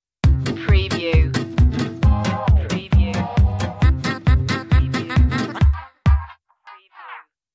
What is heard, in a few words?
on the move stinger